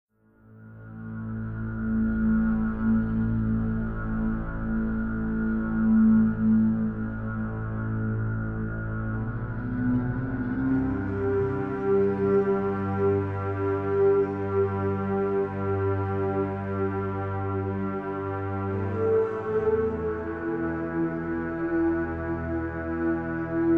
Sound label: pad; Myst III Exile